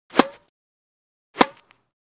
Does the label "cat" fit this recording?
No